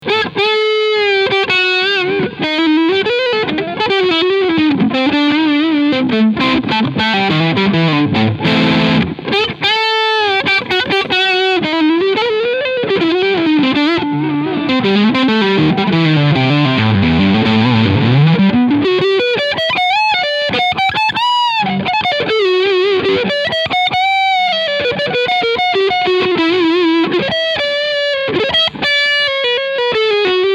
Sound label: Maybach Stradovari Leadsound Front Pickup